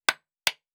Nail Wood; Survival Game Sounds - Lite Edition; Crafting

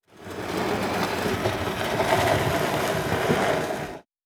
Wood Move; Survival Game Sounds - Lite Edition; Environment

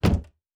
Door Close; Survival Game Sounds - Lite Edition; Doors

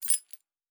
Objects Small; Survival Game Sounds - Lite Edition

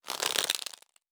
Rope; Survival Game Sounds - Lite Edition

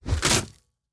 Trap; Survival Game Sounds - Lite Edition; Combat and Hunting

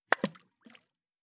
Water Splash; Survival Game Sounds - Lite Edition; Environment

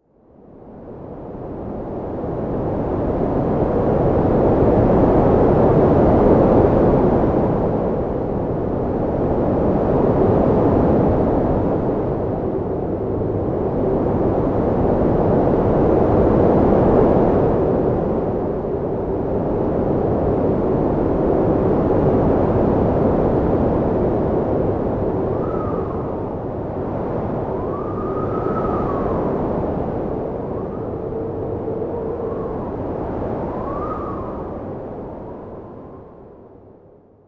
arctic winds; mixkit; distantlands; cozy

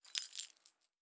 Objects Small; Survival Game Sounds - Lite Edition

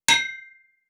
Blacksmithing, Crafting; Survival Game Sounds - Lite Edition

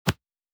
UI Simple; Survival Game Sounds - Lite Edition

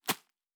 Leaf Run Surface, Footsteps; Survival Game Sounds - Lite Edition

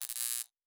Electric Spark; Survival Game Sounds - Lite Edition

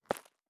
Dirt Walk Surface, Footsteps

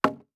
Wood

